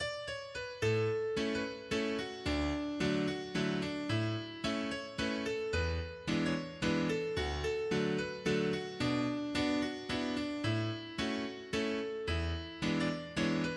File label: Waltz; Donnas Waltz